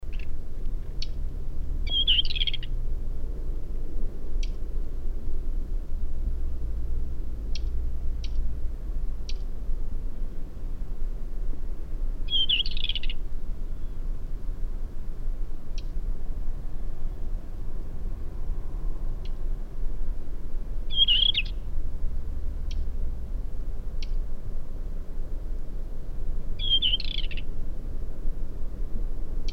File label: male song